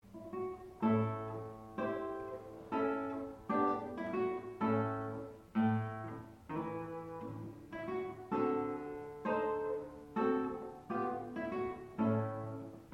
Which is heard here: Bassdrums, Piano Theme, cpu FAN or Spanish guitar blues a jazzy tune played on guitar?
Spanish guitar blues a jazzy tune played on guitar